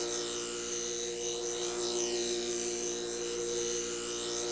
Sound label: platform light hum; electrical sparks